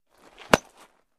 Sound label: Baseball Bat Alumin; baseball fx & games